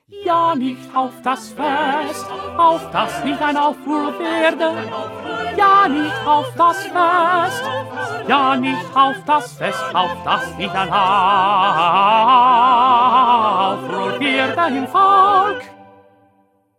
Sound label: 1st Tenor